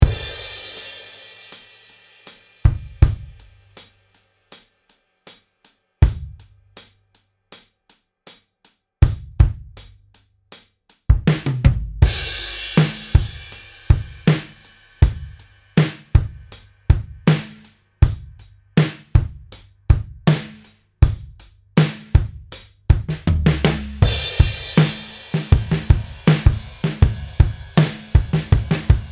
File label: drum solo